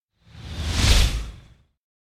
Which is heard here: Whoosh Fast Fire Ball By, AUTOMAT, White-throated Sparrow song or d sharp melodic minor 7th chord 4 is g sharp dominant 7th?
Whoosh Fast Fire Ball By